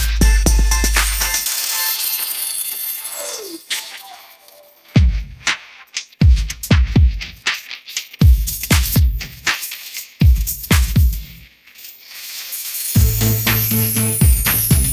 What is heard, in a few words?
sunshine pop groove, ringtones; ai-generated